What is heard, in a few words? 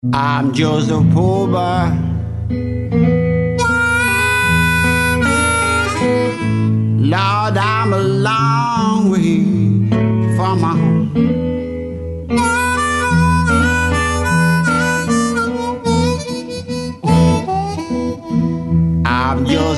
blues